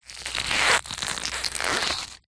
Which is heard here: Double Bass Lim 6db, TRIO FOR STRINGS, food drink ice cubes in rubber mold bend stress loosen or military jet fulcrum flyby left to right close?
food drink ice cubes in rubber mold bend stress loosen